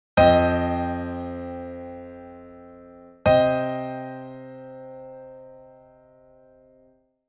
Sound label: Ninths in opening riff